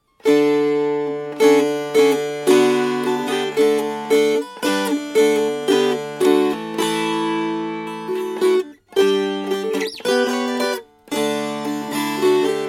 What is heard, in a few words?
Strummed